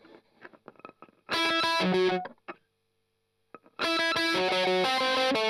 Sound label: Strum Octav